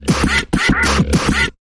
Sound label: Mechanical Percussion Variation Short Version